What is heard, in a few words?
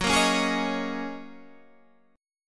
F sharp 6th Add 9th F sharp Guitar Standard; strummed; JGuitar